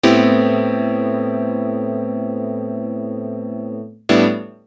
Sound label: Good Chords; Construction Kit; HIP-HOP; Sonic Foundry (Sony Creative Software)